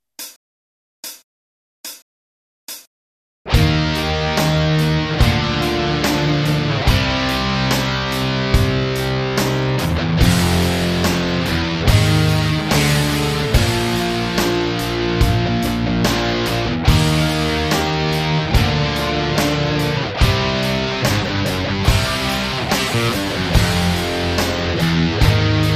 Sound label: jam